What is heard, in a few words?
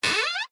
Wood Stress Creak Squeaks; DPA4060; pmsfx